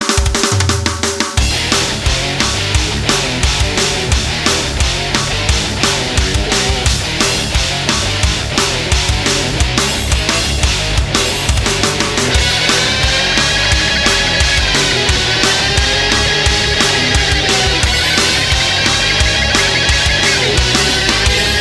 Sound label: Fast Pace Metal; Music to crash cars to – and watch them race before…